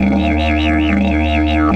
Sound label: EF 140, DIDGE RIFFS